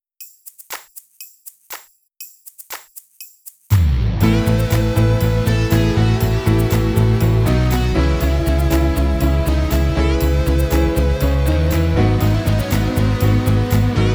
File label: drumless